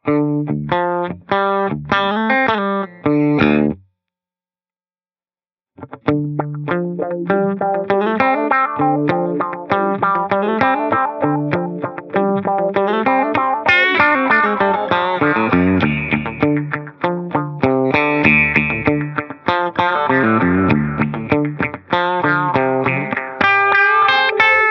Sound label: Filter Warp